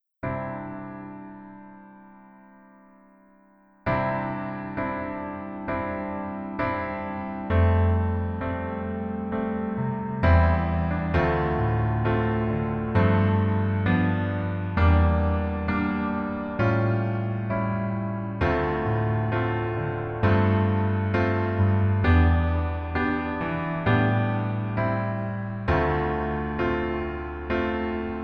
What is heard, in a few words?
Piano